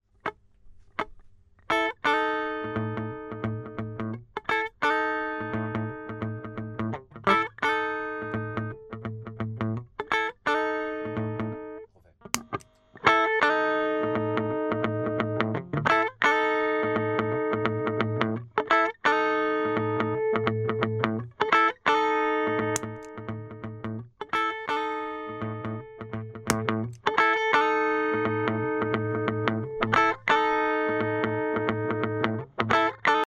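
Without / With Sardine Can (Compressor Only), guitar; Celmo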